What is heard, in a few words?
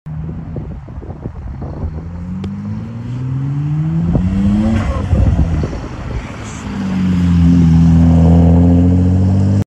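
Nissan Skyline, car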